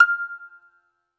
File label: BAGLAMA F0UL; E-MU Producer Series Vol. 7 – Old World Instruments